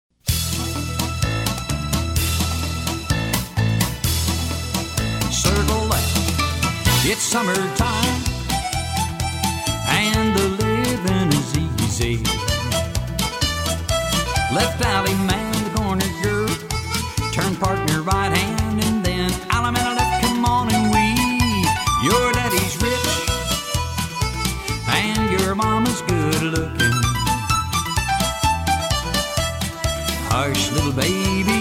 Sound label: vocal